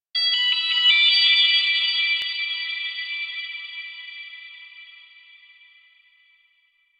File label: Pager Ringing; Warframe; Lotus Eaters; Quests